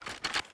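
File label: Inspect Movement; Valorant; judge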